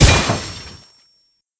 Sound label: chest Land; fairyred